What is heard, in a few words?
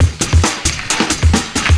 FILLS, 70_s Breakbeats